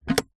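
vehicles car plastic storage compartment lid open interior toyota rav4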